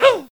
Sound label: Goblin Hit; The Battle for Wesnoth